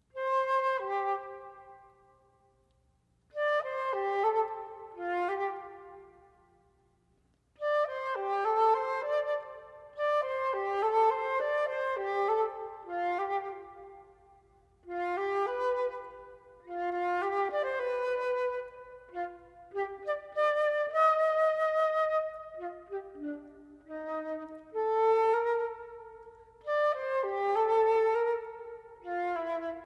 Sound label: solo for flute